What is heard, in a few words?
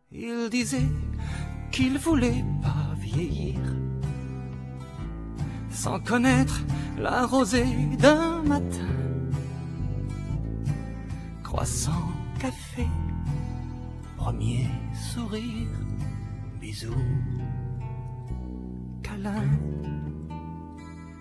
piano